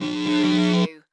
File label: Electric Pulse Swell